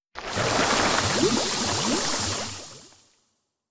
Oil

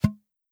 Human Hunter Pop

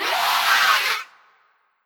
Feminine Death; Legend of Keepers (gamerip) (2019); Feminine Death V O

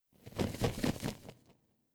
Hawk Flap; Hawk Flap Multi1

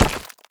Dung Ball Death

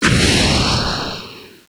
Fire Fly

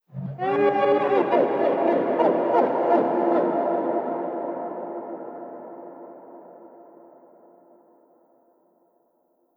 Animals

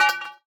Physics Object Weight Impact

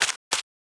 Hi Merling Run

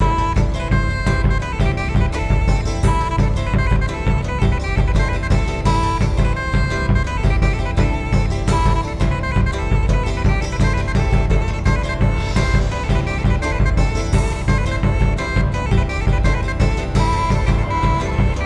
battle phase

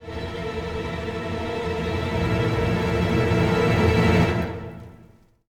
Suspence

